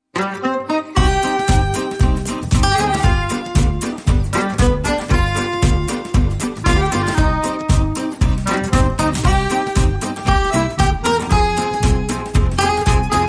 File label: jingle